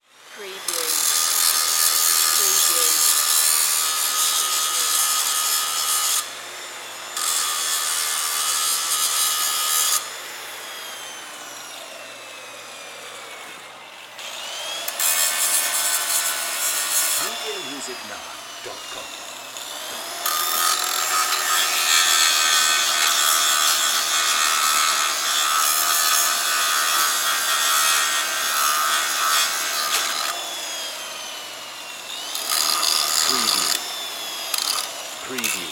Hand Grinder Operate

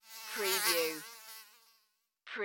Mosquito Fly By

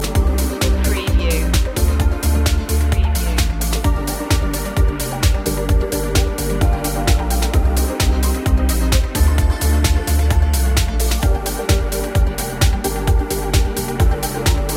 emotional dance loop

